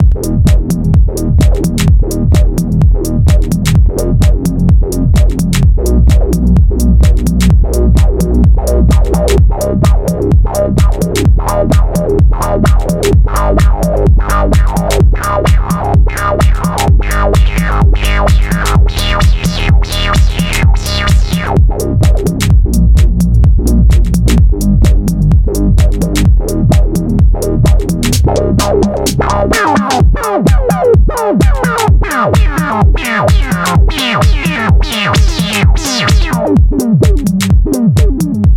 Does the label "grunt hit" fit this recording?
No